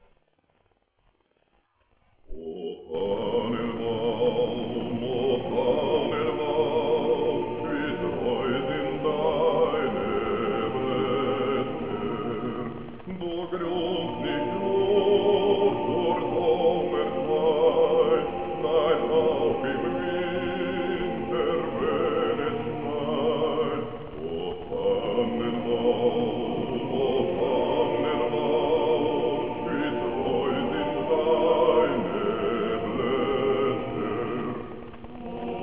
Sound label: Tannenbaum; Basso